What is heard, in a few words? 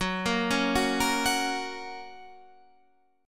Gb Major Gb Guitar Standard; arpeggiated